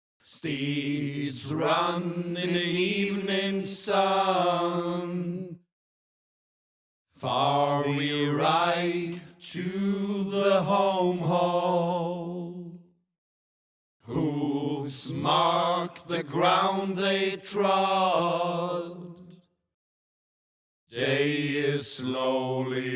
drum+chorus+tagelharpa+flute

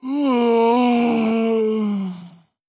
Yawns Goddess

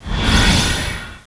New Ice Cast; Knight Online

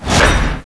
Hammer Pull; Knight Online

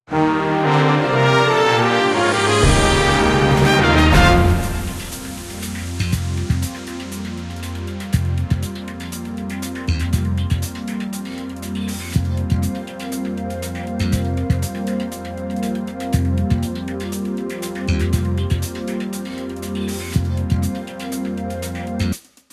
Mission Complete Jingle 120 4-4

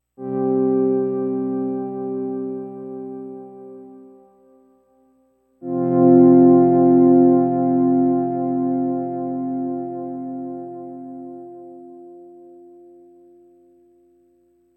Intro 2 Chords

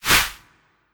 Ice; Impaler Ice Swo

